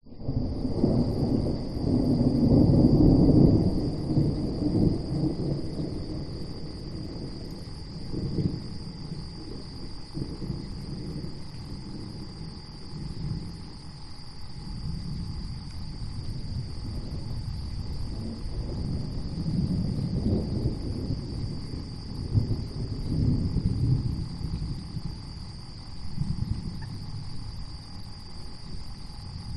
nature thunderstorm approach insects night blustery winds at end